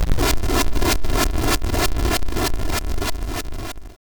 Boomerang (Sound); Gloom

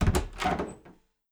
DOOR Indoor Wood Open; mono; adams venture origins

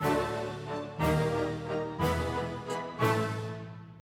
Waltz Intro

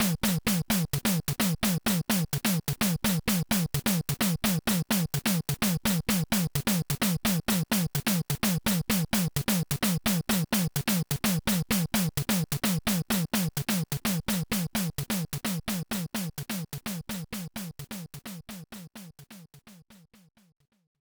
Stereo, Transition Beat; super spy hunter; nes

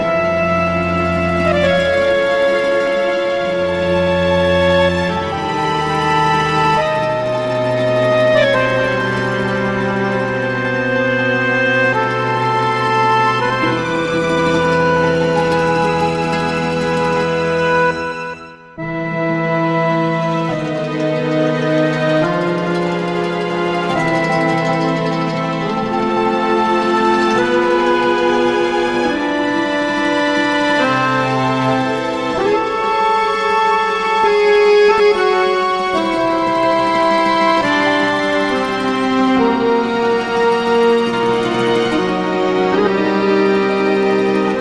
Suspense Main; Shantae and the Pirate's Curse; Riskyboots

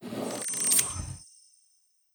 applications open

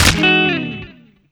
Flower Breaking

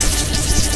Orb Smith Forge

